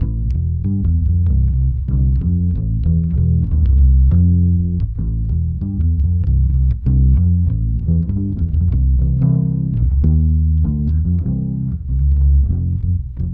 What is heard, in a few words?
bass